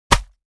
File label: Axe Impact Body